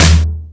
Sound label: FX Sounds, Drum Machines